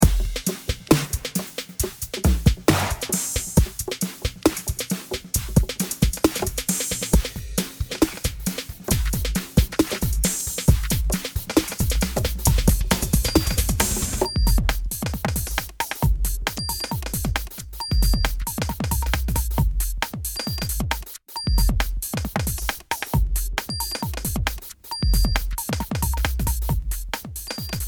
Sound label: edm upbeat song